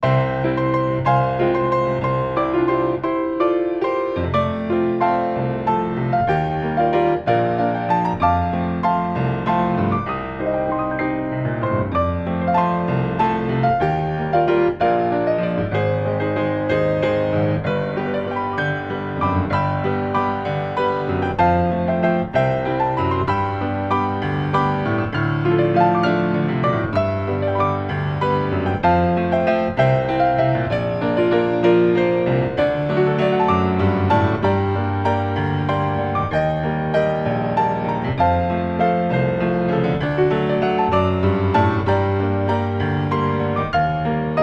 Grand Allegro Majestic Waltz; adv 1 ballet; Enchainment Music - Slow 3/4

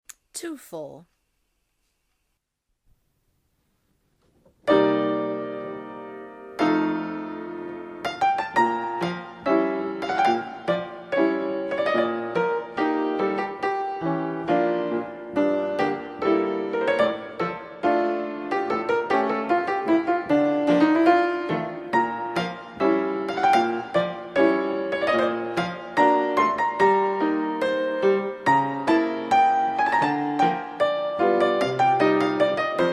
Enchainments 2 4 Polka Jete, ballet; grade 2 ballet

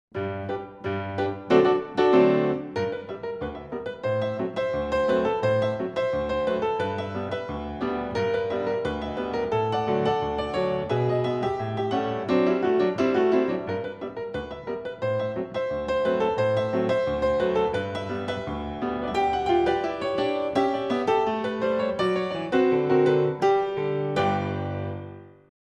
Beaten work, intermediate ballet

